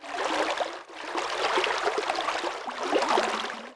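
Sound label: river shore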